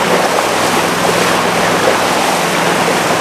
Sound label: pontoon fast water loop, airboat; vehicles; Half Life Model Pack